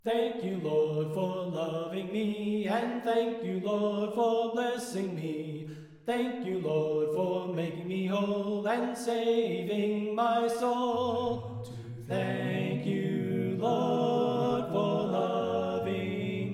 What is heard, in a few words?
Tenor Left